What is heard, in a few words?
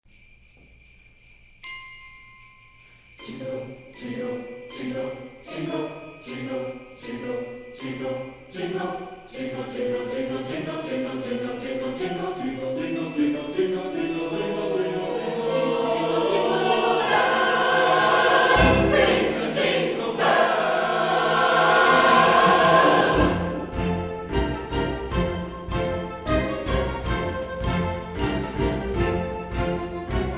Jingle Bells, Chorale; Variations on “Jingle Bells”